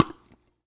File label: Wack